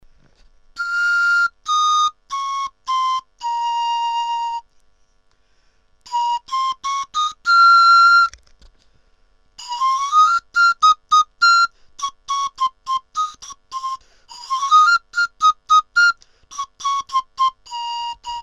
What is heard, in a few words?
panpipe; Jorvik